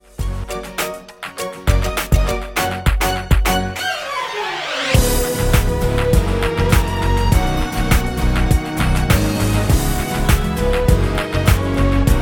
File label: Accompaniment